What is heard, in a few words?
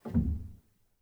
rel, Grand Piano; bitKlavier Grand; Piano Bar